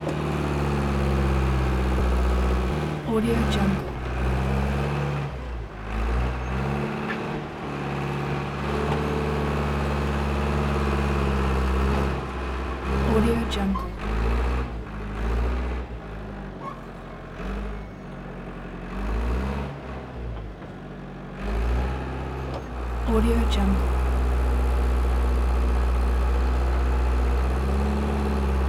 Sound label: bulldozer international 100e; prosoundfx